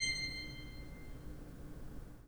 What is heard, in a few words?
PIPE, 3DOrgans